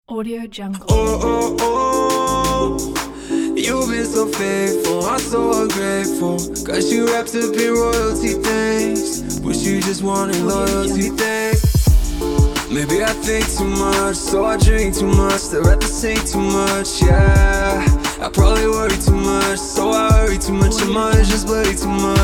urban hip hop vocals

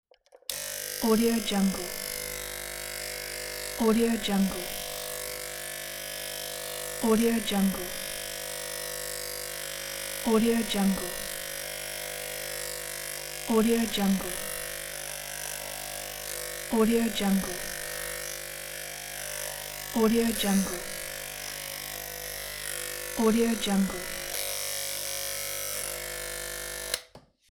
hair clipper

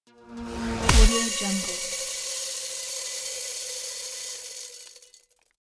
long spill snap cinematic impact

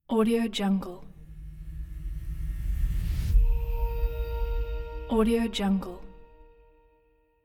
cinematic tone

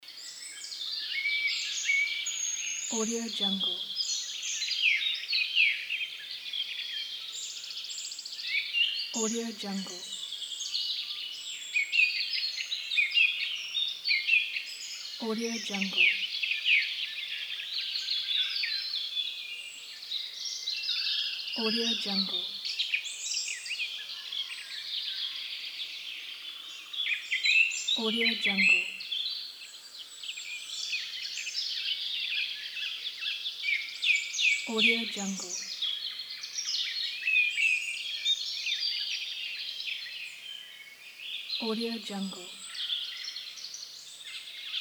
forest

